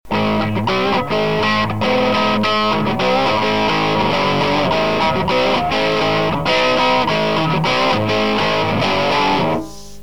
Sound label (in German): angezerrt; elektro